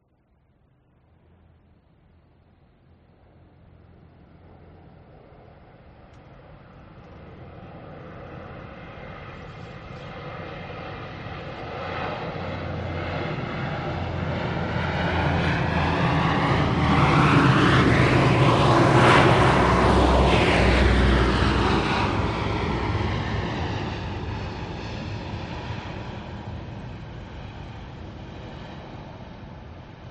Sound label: vehicles airplanes military c130 hercules lockheed fly overhead